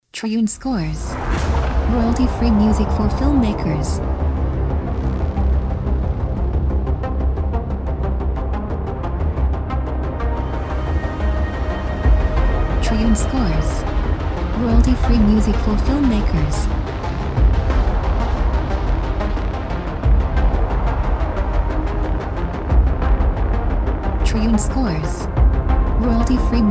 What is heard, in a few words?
Monster; Triune Films